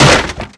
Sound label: bouncy plywood hit; bouncy objects; Tony Hawk's Project 8; XBOX